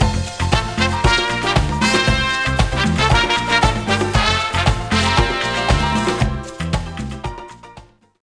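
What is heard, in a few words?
latin dance; Compton's Interactive Encyclopedia (Version 6.0)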